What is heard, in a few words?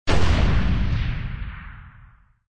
Ball Arrival